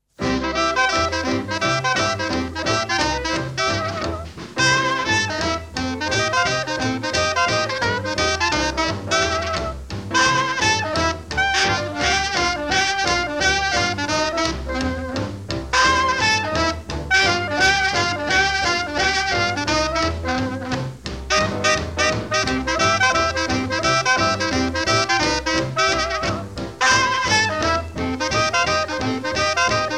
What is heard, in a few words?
Stomp